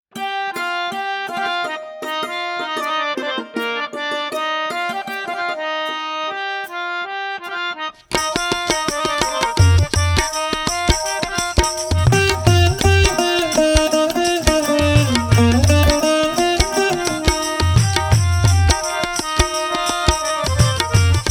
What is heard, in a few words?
Ladies Karaoke D Sharp Pancham; Jai Durga Lakshmi Saraswati